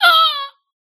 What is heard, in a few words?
Cry; Life of a Naomi